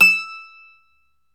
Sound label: MANDOLIN